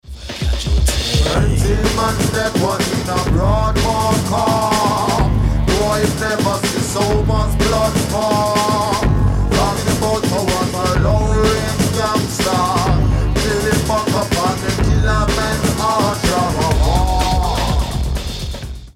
Beat

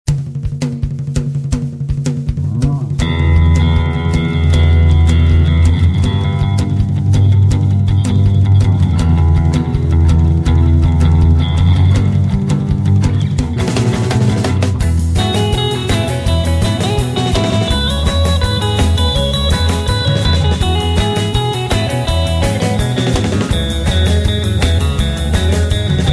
Driving Guitars; Guitar Mania Vol.11

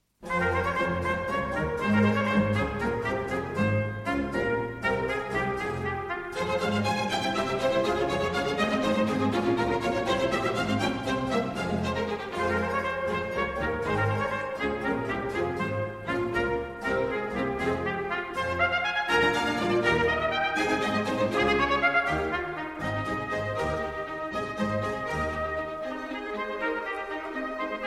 Trumpet Concerto in F minor, Vivace

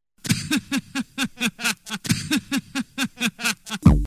Laughs

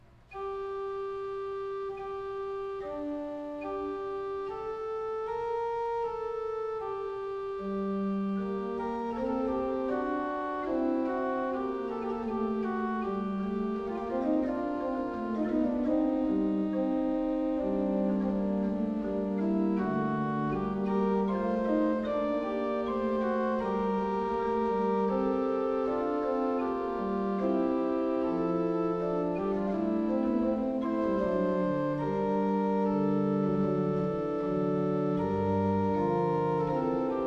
Chorale Prelude, Organ; ''Der Herr ist mein getreuer Hirt''; Johann Pachelbel; Complete Organ Works

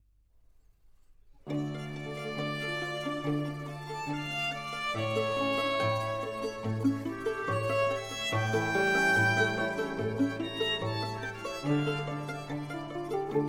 Concerto for 3 Violins in F Major, Andante; Vivaldi; RV 551

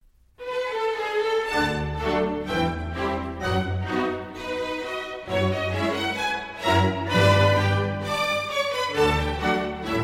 Gavotte. Non troppo allegro (Instrumental); Prokofiev; Symphonies Nos. 1 Classical & 5

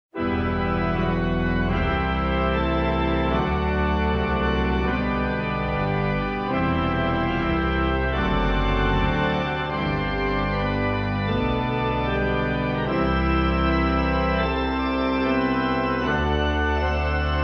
Organ Music; 1965; La Grande Course autour du monde

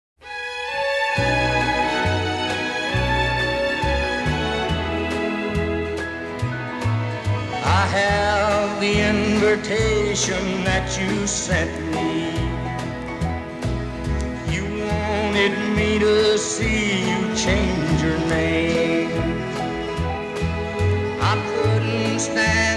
String Overdub Version

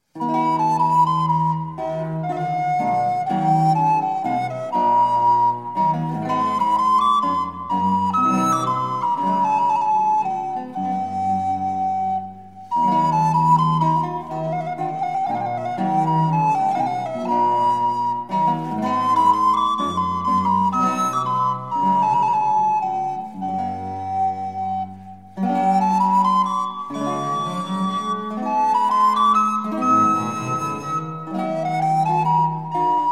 Menuet, Recorder; Suite No. 6 in F Minor; VI. Menuet

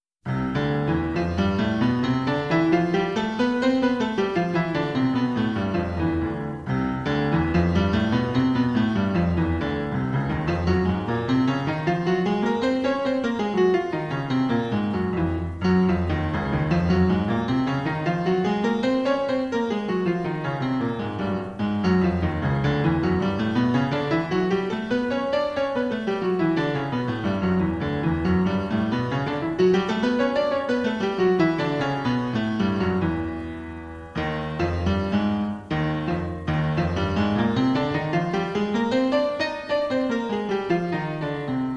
Piano Practice

